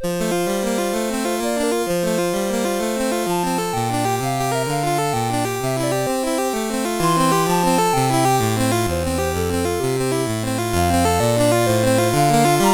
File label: Flute Sonata in A Minor, CSG8580R5; Flute Sonata No7 in A Minor Pt3